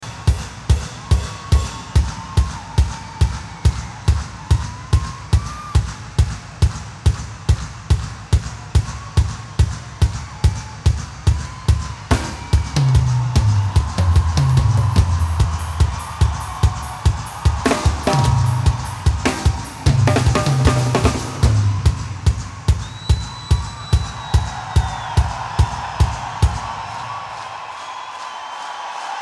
Drum Solo

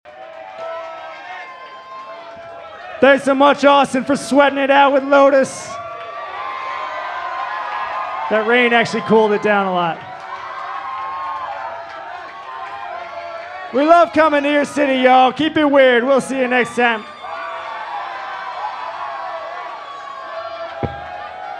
Crowd Banter

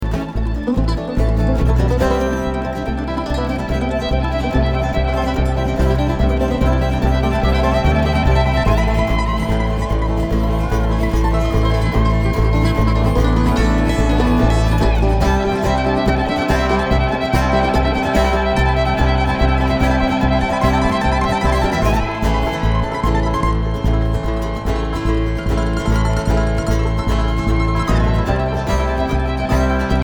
Traffic Jam

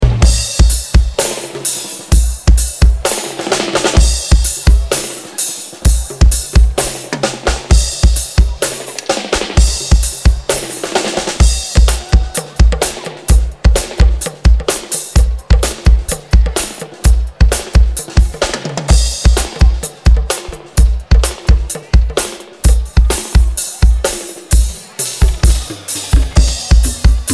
Drum Dub

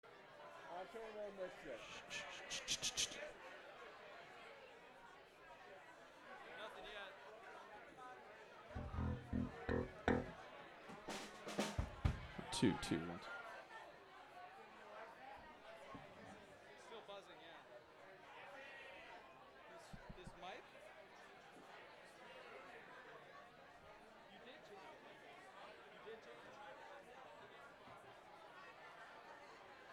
tuning

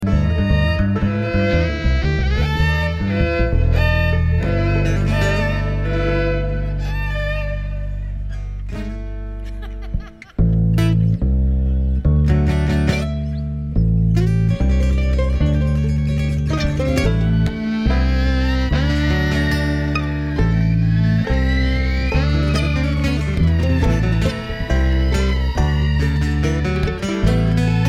Traffic Jam

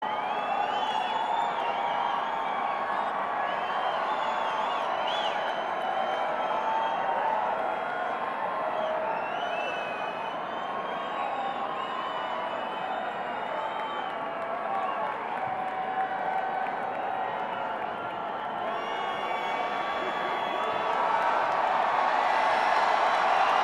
Banter Crowd

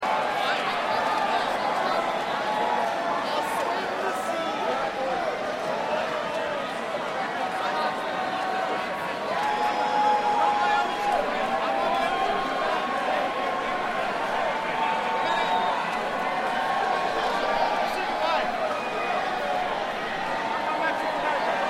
Crowd

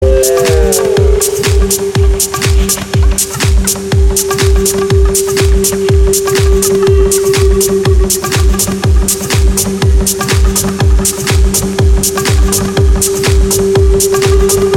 House Jam

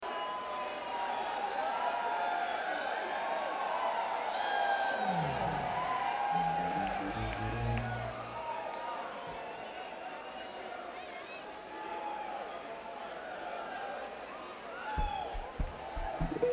Crowd

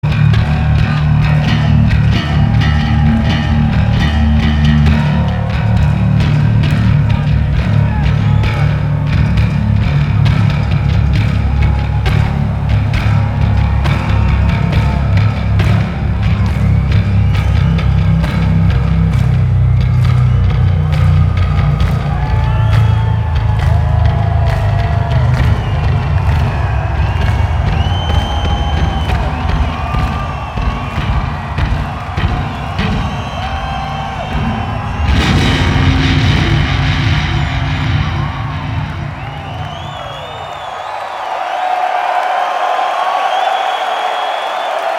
Bass Solo

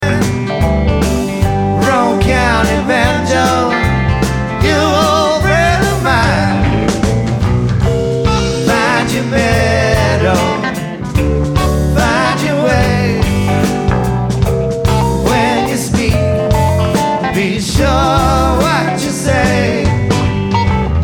Banjo

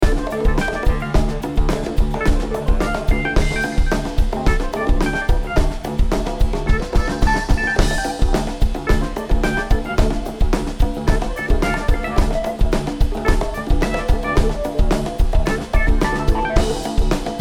Drums

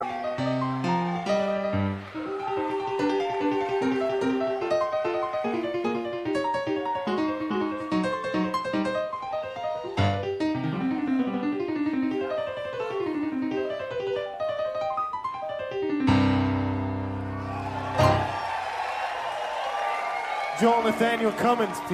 Piano Solo

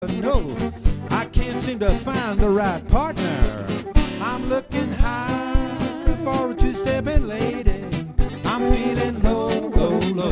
Country and Western Swing

